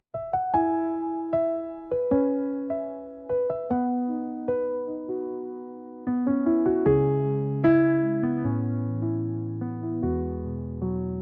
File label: tanya lullaby